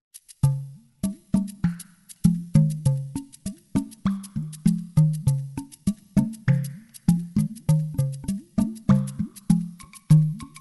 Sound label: Slap Tubes Udu